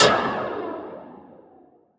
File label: Sfx, Trance; Zero-G Trance Inducer; General Sfx